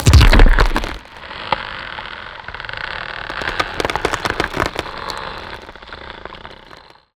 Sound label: organic texture; BlueZone; Organic Lifeform Textures